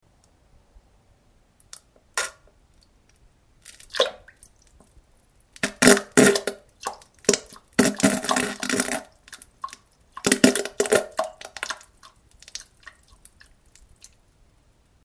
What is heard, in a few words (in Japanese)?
げりよりなんべんおなら ぶ ぽちゃ ぶりゅりゅぽちゃ音